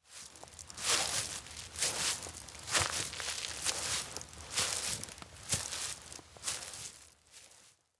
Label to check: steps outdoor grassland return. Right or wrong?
Right